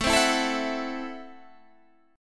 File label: Gb Minor 7th Flat 5th, Guitar